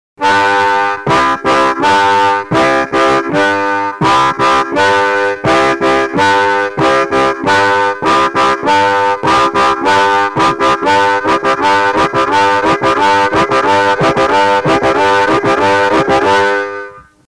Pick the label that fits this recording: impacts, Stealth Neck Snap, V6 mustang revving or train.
train